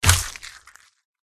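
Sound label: moray attack contact up; murena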